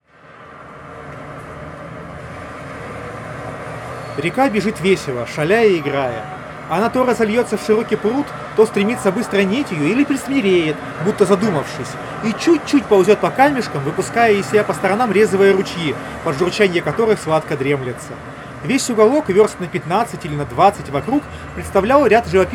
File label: Recorder noise; Запись на профессиональный рекордер